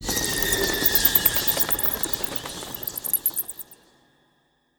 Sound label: Dis Bats